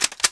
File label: gun cock